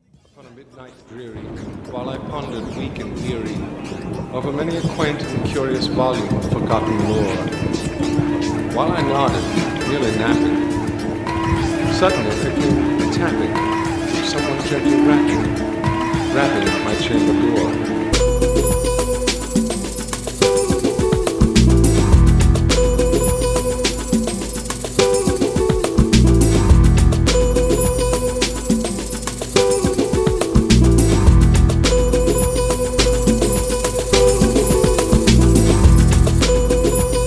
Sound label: instrumental